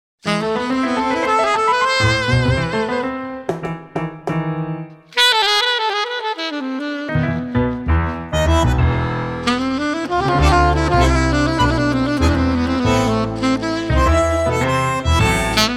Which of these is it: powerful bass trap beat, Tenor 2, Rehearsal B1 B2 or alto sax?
alto sax